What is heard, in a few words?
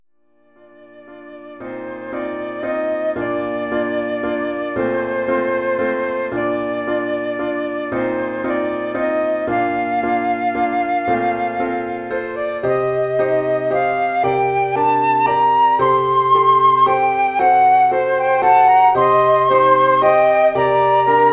flute duet